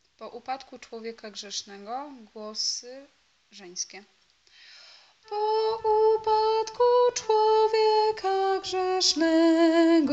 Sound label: sopran; Po upadku czlowieka grzesznego; Adwent